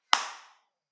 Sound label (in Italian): Risposta impulso ESS